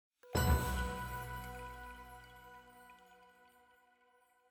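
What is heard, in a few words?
item Jingle Bell chime+ Percussion B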